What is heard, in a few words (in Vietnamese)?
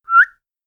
tieng huyt gio ngan mot am duy nhat cham